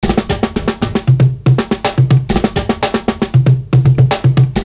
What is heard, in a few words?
X fill; Univers Sons